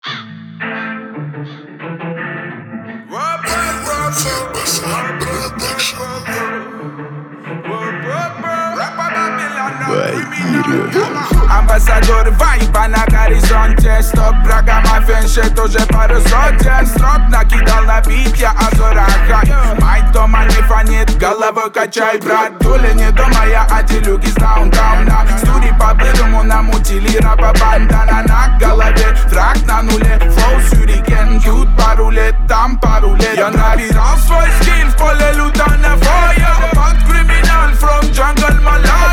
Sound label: 37-41Hz Low Bass